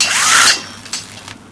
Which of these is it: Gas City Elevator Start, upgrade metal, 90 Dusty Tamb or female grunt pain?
upgrade metal